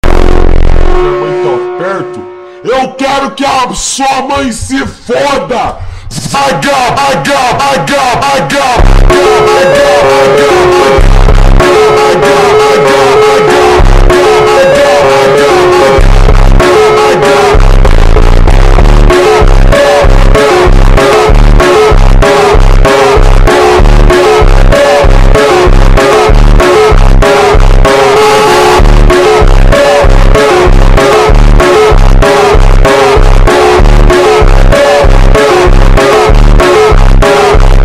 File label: FUNK Over Slowed